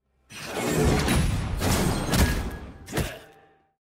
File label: Q No Dialogue